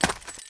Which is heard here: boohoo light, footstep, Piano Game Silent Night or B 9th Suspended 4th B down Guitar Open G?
footstep